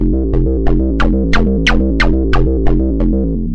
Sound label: triplet synth bass